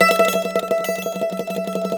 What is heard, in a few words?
MANDOLIN; StTremolMandolin